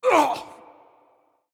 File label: hitreact human male large; smite; npc; roman archer